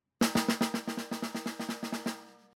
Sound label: Snare